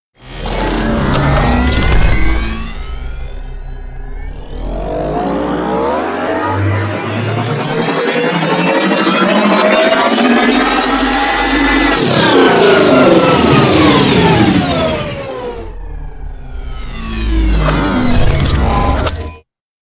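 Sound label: transformer, Active Sound System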